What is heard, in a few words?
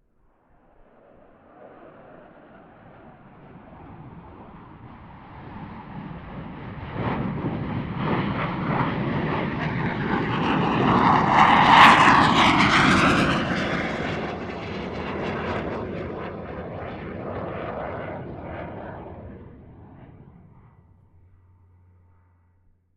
AIRCRAFT FA-18 HORNET PASS; RAAF FA-18 Hornet; Airplanes